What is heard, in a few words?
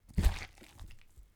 Squelch